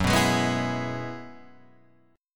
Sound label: Gb 9th Suspended 4th, Guitar Open G